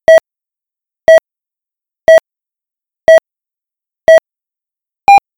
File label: startbeep